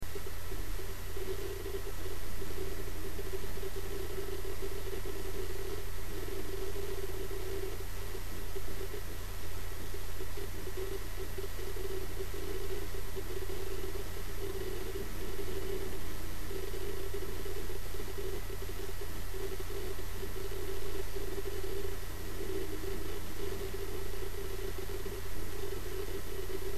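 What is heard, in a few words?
samsung, hard disk; hd 120 seagate samsung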